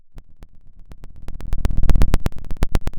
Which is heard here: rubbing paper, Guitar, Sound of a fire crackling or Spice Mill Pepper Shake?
Sound of a fire crackling